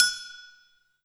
High Agogo

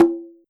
Yamaha RM50 Low Conga

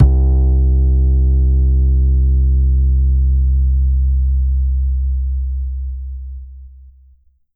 Ensoniq ZR-76 Uprite Bass C2

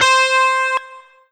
Roland SC 88 Charang Lead C5

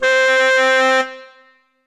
E-Mu Proteus FX Stereo Sax C4

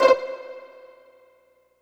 Ensoniq SQ-1 Pizzicato C5

